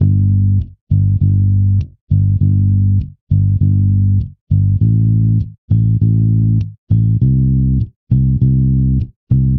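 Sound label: Bass Pop G major 100bpm; Guitar and Bass; Ableton; Factory Packs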